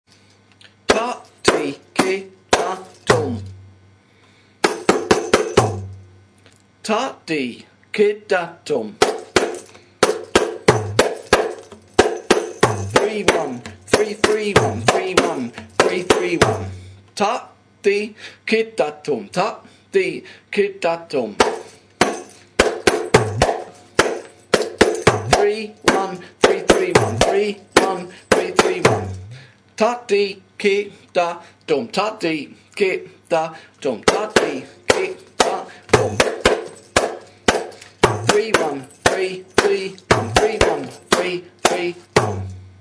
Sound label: kanjira tatikidatum; pete